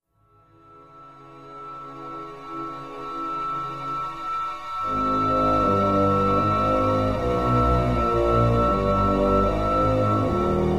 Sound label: suspense, music; mohaa